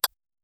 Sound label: clock